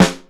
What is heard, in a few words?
Snare open; GMPercussion; 3DO; Toolkit 1.5; GMPercussion44k